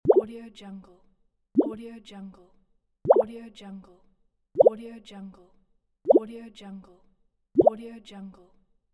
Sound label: water drop